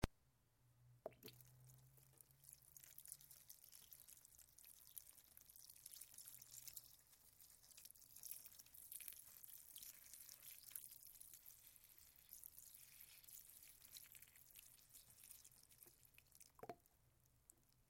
Watering Flowers

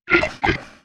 Pig

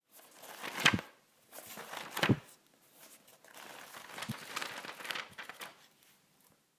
Flipping Through A Book

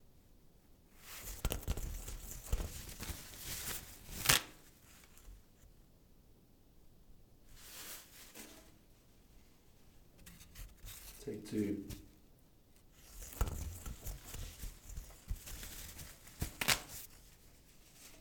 Toilet Paper Roll And Ripping

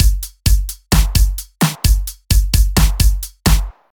Drum loop

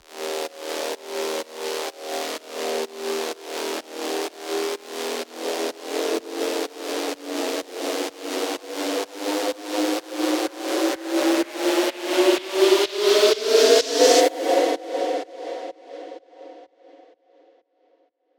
Agressive Riser 126 Bpm

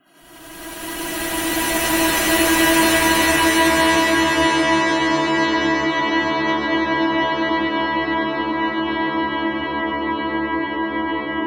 Fast Attack Ambient Suspense Cinematic Long Decay

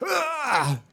Male Death Cry

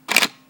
Nikon DSLR Camera Shutter

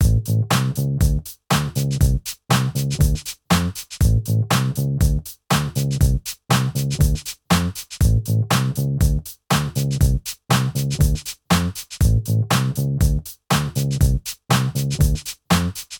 Bass Loops With Drums Short Loop 120 Bpm

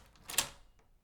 Key Twist In Lock

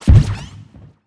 Punching Deploy Shot

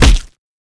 Bloody Punch

